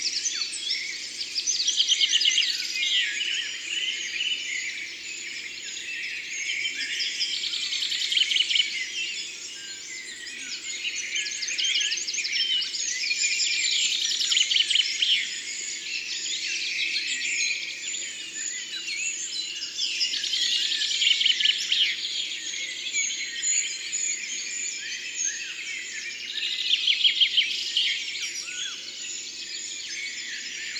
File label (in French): Pison des arbres, Concerts Naturels de la Drome; Studio les Trois Becs